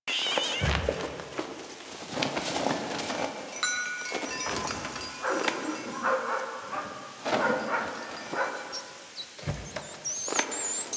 Ambient Loop E, Village Exterior; Warframe; Ambience; Eidolon Plains; Ostron Village

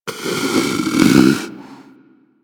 Predator Snore; Warframe; Hunting Critters

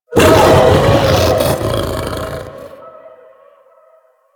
Ability Warning Scream B; Enemies; Vocals; Warframe; The New War; Archon; Amar

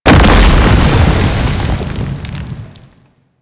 Ground Geyser; Octopede; Unholy; Warframe; Man In The Wall

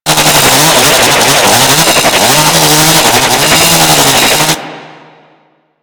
Sawman Charge Attack Loop; Attacks; Ghoul VIP; Warframe; Grineer Ghouls

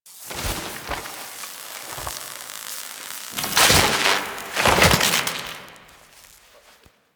Shotgun Foley Recovering; Kahl; Warframe; The New War; Grineer Eidolon Shotgun

